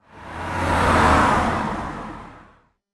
Car Passing

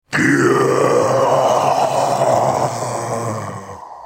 Deep Demonic Growl; horror

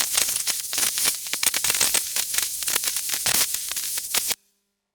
Electric Spark

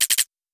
Signal Break Glitch

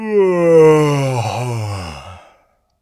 Sleepy Yawn

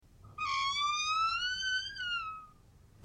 Old Wooden Door Creaking Open; horror

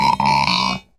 Pig Oink; Kids content

